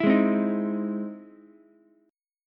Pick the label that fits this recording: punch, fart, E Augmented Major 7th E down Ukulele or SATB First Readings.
E Augmented Major 7th E down Ukulele